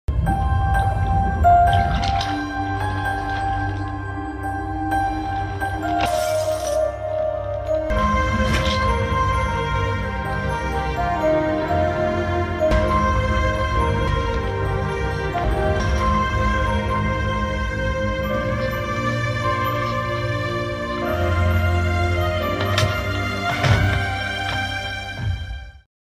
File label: sad bgm; tillu square